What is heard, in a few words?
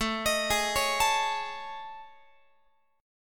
A Major Sharp 11th, Guitar; AM#11 arpeggiated